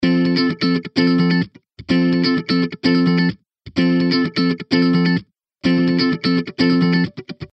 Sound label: Funk